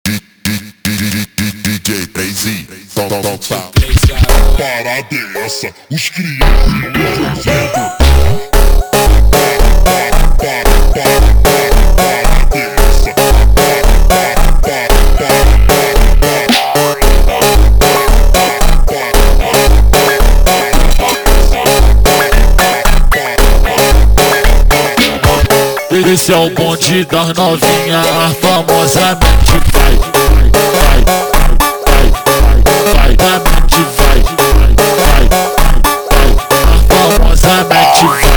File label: Montagem slowed